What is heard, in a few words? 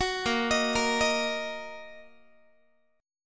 A sharp Suspended 4th Sharp 5th A sharp, Guitar; A#sus4#5 arpeggiated; 21,18,18,20,19,x